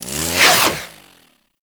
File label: Kusarigama Plasma Whoosh; Warframe; Grineer